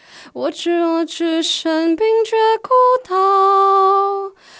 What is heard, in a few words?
Alto